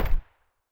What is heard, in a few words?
sa58 silencer mono far